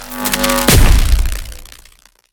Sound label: lightning bolt; battle